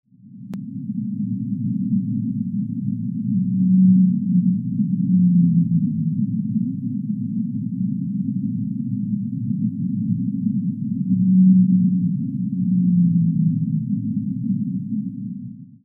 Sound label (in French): Rorqual bleu; baleines et dauphins